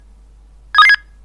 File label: Siemens x45 Ton